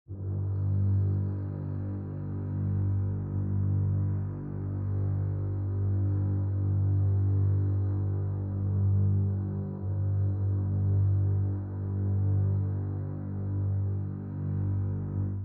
G Note Very Low, String Ensemble

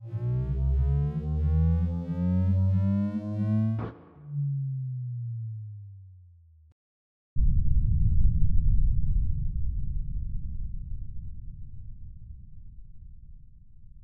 A Gas Weapon; 384 Sound Designs

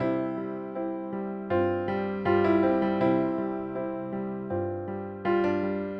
Delicate Piano

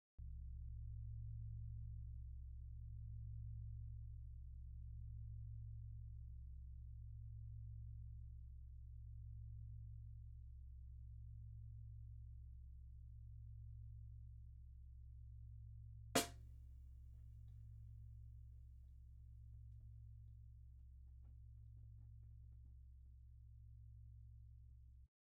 Drums Cymbal

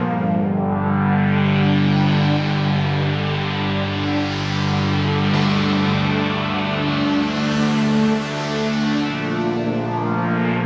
Gm Pad; Drums- Downtempo Loops & Samples; Sanctum

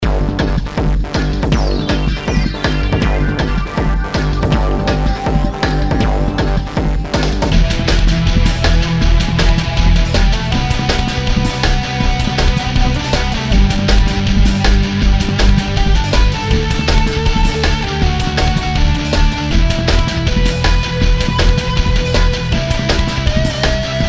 Music background pads

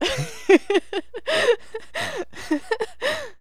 Woman Laugh; laughs-chuckles

